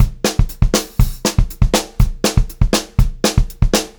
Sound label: BEAT, Pop-Rock Drumloops; USB Soundscan vol.37; AKAI; 90 s Sample CDs; 120 SPEED